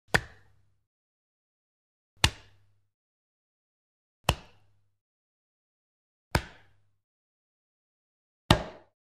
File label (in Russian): molotok dlya myasa